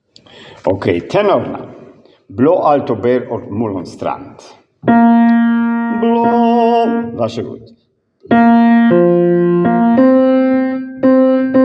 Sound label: tenor